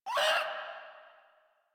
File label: Infested Runner Pain; Warframe; Runners